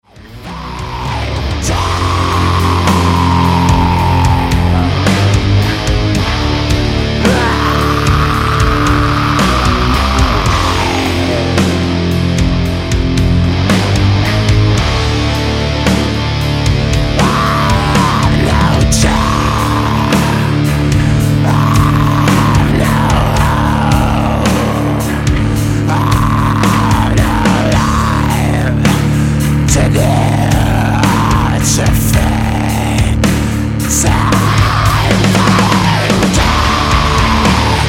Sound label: Stoner